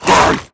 attack grunt, zombie; creatures

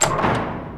default move, doors

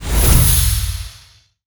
combine switch box close start; mechanical units

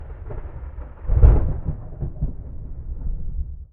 thunder distant, ambient weather

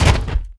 dog footstep run; npc; Half Life 2; Episode 1

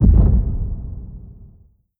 step lfe, strider; creatures; Half Life Model Pack

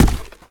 cardboard box impact hard; physics

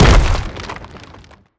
bash hard, brickwall, destruction

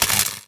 electrocuted spark

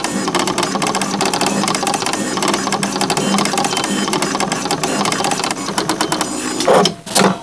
equipment printer loop, labs; Half Life Model Pack; Half Life 2; ambient levels

